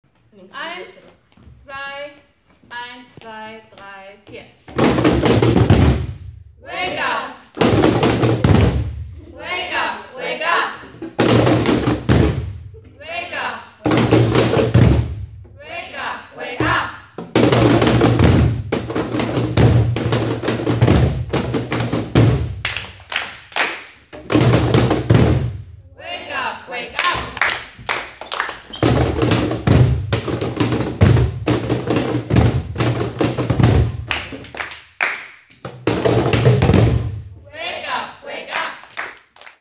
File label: cajon